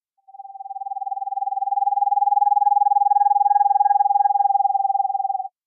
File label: bearded, owl